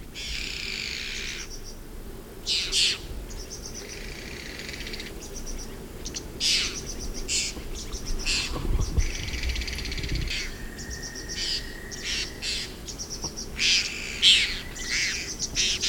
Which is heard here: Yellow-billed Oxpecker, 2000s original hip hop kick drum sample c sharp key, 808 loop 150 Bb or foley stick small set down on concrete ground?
Yellow-billed Oxpecker